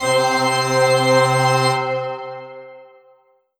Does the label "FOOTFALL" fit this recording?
No